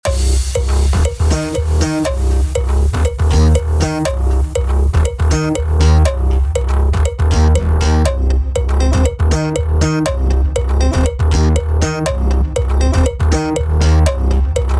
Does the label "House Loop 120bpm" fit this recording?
Yes